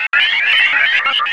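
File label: sphere blips large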